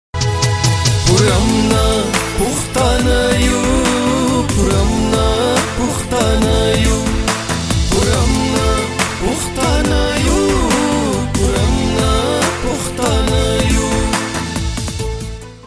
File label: Pashto